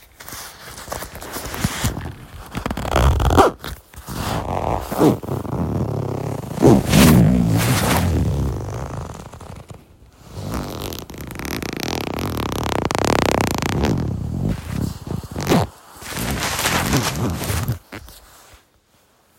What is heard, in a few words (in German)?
Leichensack wird geschlossen; Otoene